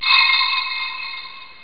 glass

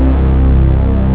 lead

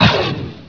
SHOT; homm2; FALLEN

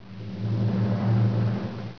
DRUM; homm2

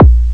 kick

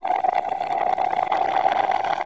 GURGLE; homm2

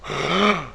GASP; homm2

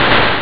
crash

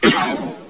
SHOT; homm2; FALLEN

